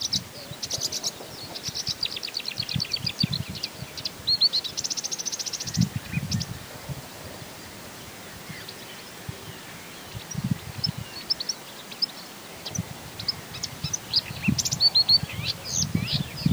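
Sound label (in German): sumpfrohrsaenger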